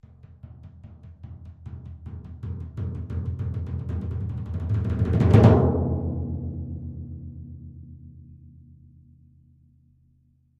Timpani Hands Low Cre; mallets timpani

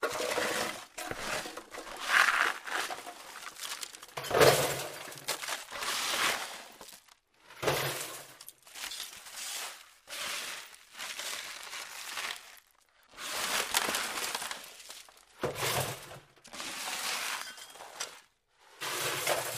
Junk Swept Up By Broom Put In Garbage Can

